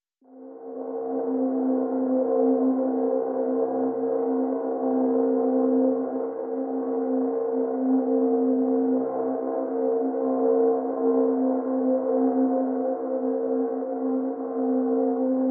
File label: Pads C